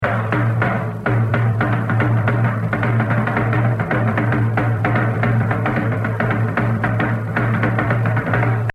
Solo, jazz drumming; The Evolution of Jazz Drumming (Gottlieb)

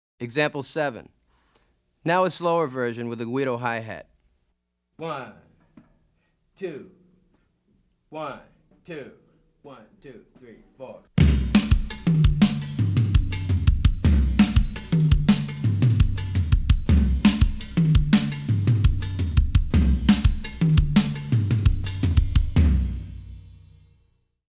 Afro-Cuban Grooves For Bass And Drums

